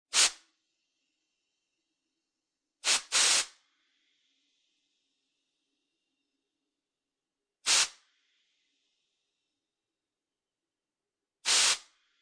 Foley, Air Can Spray, Multiple Short Length Sprays; Spraying